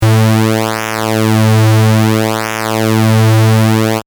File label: pwm 110 Hz mod 0.3 Hz sine